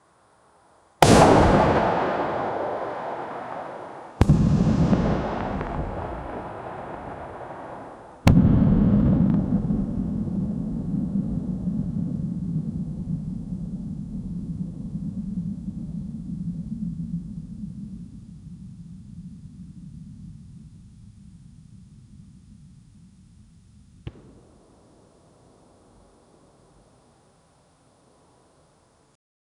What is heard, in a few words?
dynamic close up shot a d, nuclear blast